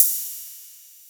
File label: nice open hi hat sound g sharp key; Duration 0:01; open hat samples